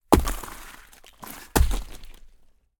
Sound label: KETTLEBELL SMASH DEBRIS, Ice